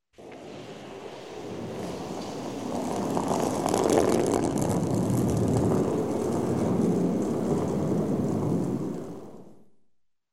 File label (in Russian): kyorling kamen skolzit po ldu